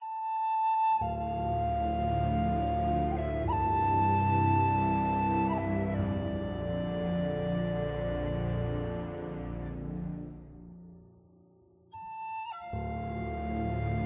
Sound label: Whistlechords